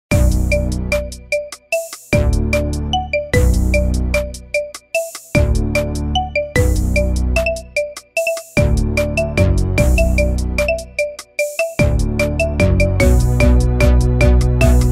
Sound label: Marimba Cover